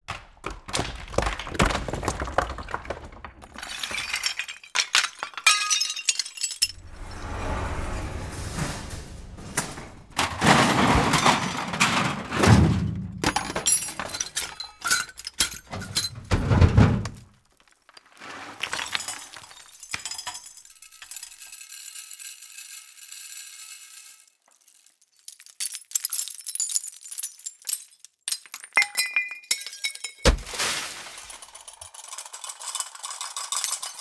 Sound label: destruction zone raw sounds